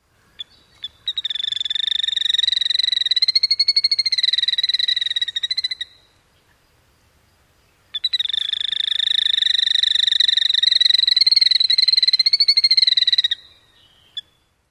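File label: sputters alarm near nest, killdeer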